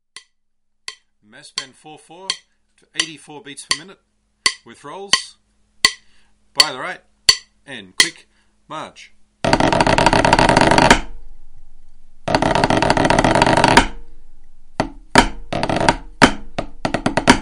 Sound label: Massed Band 44 84bpm